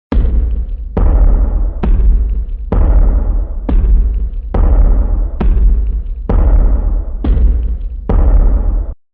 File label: heavy footsteps